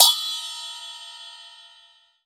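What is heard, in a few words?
chinese cymbal open; AKAI S6000 CD-ROM - Volume 5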